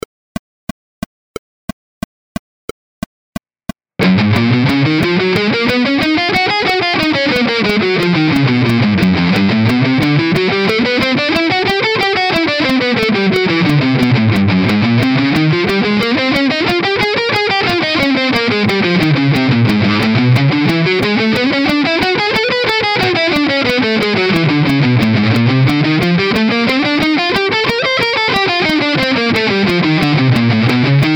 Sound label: scales rhythmic variation